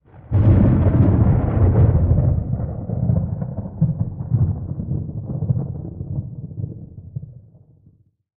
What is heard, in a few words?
Small ambient pre storm; STALZONE